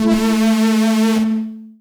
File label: technosyn2, Techno Trance; Ueberschall; SYNTH